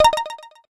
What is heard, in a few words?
HTC Touch Pro2 And Diamond2 Stock Notification; Dopple